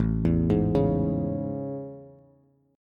A Major 7th A, Bass; AM7; arpeggiated; JGuitar

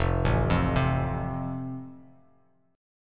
F Major, Bass Standard; arpeggiated

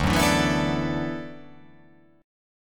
D 6th Add 9th D

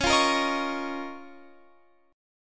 C sharp 6th Add 9th, Guitar, Open F; C#6add9; strummed; tuning=Open F; guitar chord

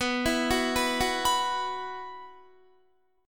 B Suspended 4th, Guitar; Bsus4; arpeggiated; 19,19,16,16,18,18

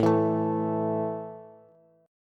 A 5th A down Bass; strummed

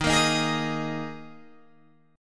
D sharp Suspended 4th, Guitar, Open G

